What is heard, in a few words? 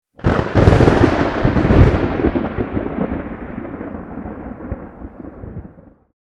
Dracula thunder strike vampire